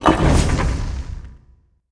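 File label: upgrade stone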